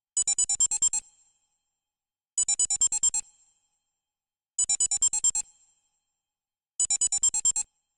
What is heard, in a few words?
Digivice Beep x4 Clean